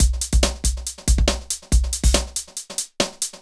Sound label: Synth Loop BPM140